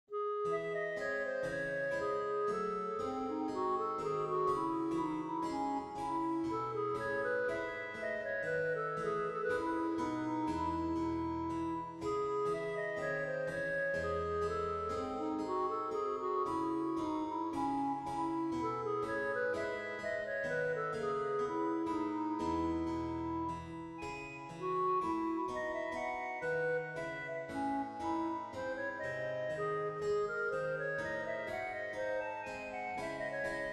Bach Minuet Harpsichord; Organteq + F E Blanchet Harpsichord 415; Two instances; Pianoteq

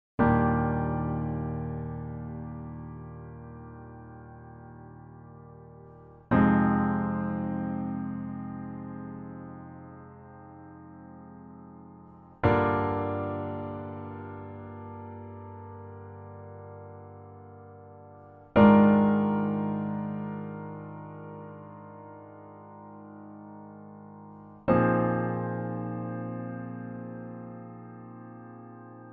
Sweet Spot NY Steinway Model D Variation

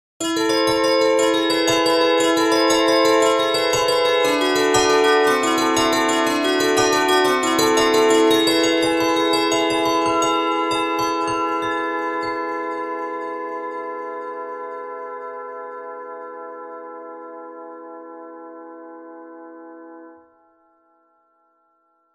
pianoteq

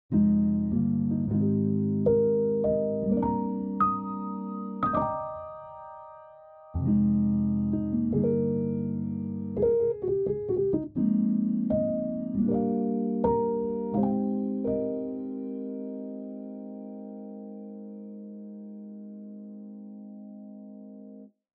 lofi

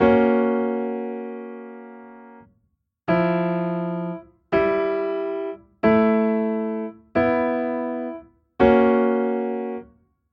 ezkeys grand

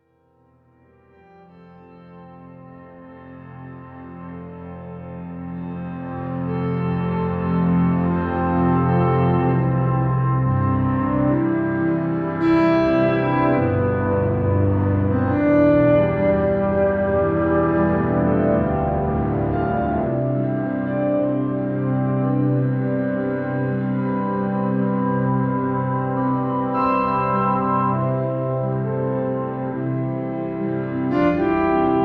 piano synth style; Pianoteq

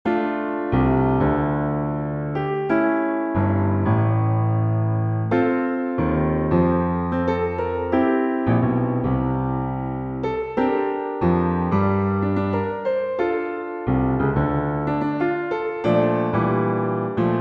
Sarabanda Rock

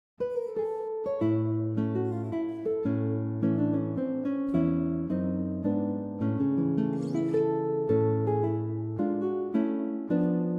Valse Aire moderado; Brisas del Parnaso; Ferrer

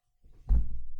Bosendorfer sustain fast release

